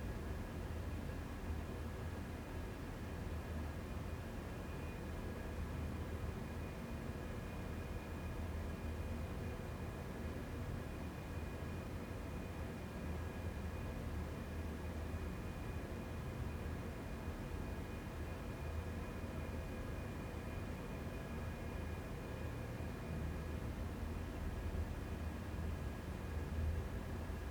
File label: indoor factory; teardown; ps5 windows xbox series xs